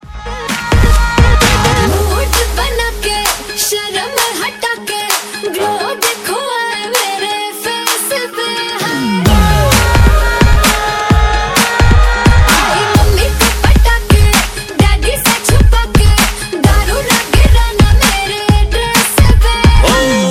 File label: bollywood ringtone